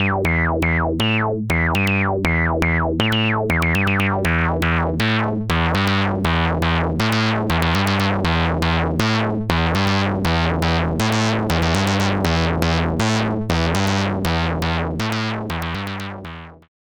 Studio Electronics Tonestar 2600 Saw Out to LFO Out